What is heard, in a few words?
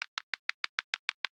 Mushroom Run Loop